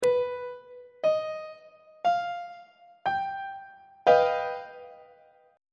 f double sharp augmented 7th 1st inversion on treble clef